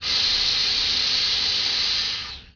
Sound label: air zero; OpenBVE; loose stock